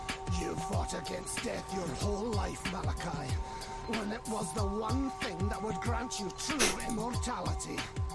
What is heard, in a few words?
templar rap